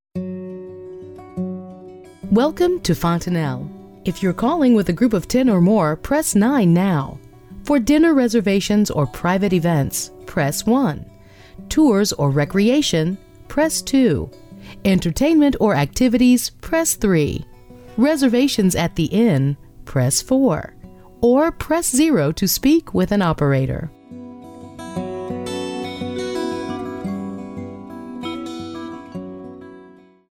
Main Music; Fontanel

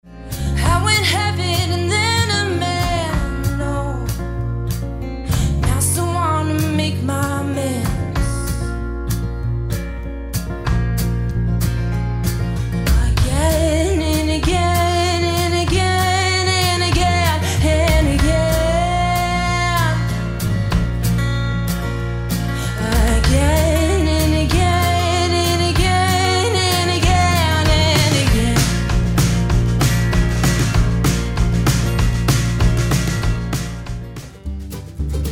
brite acoustic

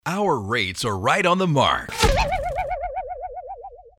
arrow

